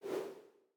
navigation transition left; Google; Material Design sounds; Secondary System Sounds

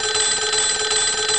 Alarm Melody; Siemens C65 Plus PL

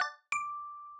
Charging Started

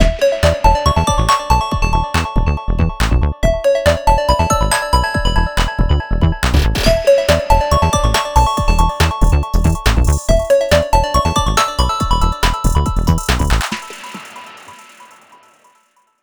Vibrancy, ringtones; Honor; 600 Pro China

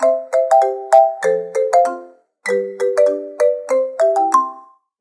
Marimba C Minor; ZTE Nubia Red Magic 8 Pro Plus; ringtones

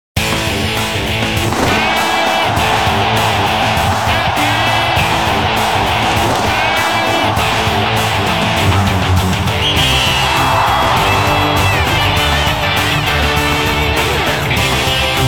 Football ringtone; Nokia; audio themes

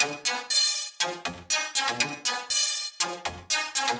Springy Jalopy, ringtones

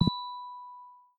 Tone, notifications; Motorola; Edge 70 Max China

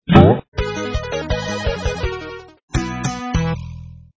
Placed Block; INOI 108R; Tetris®

